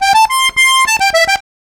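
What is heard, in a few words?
Accordion Lick, C; Zero-G Kocktail Kollection; Accordion Lix; 90 s Sample CDs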